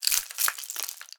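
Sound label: rattlebone, percussion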